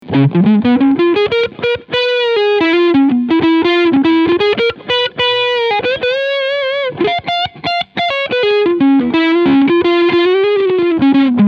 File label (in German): TE 52 Overdrive beide PUs; Harley Benton